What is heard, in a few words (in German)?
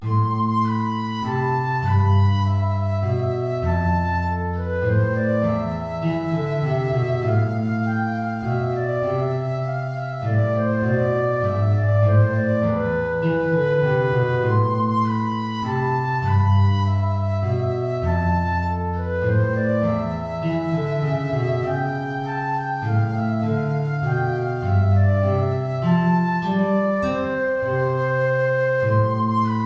Menuet (Querflöte & Gitarre); BWV Anh 115